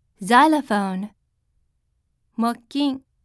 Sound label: xylophone